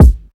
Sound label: Kick, Modern Drum Kit; Boombox; Cymatics; ANALOG EVOLUTION Beta